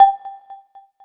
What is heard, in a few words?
question Correct, Dart Sounds; World Masters Pro